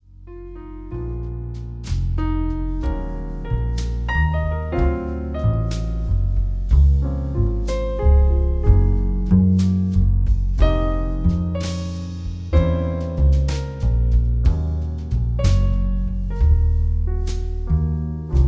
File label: jazz trio